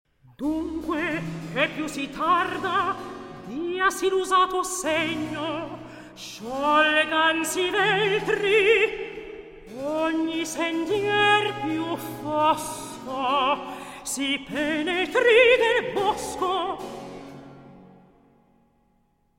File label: Recitativo; Antiope